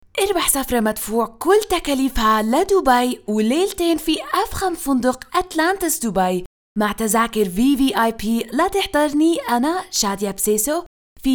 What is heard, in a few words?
Arabic Ramadan